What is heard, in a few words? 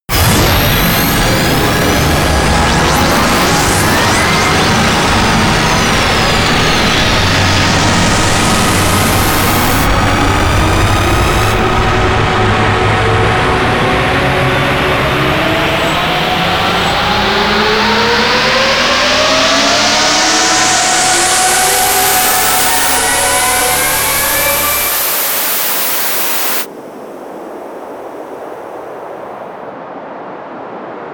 white noise liftoff, Risers; whooshes rises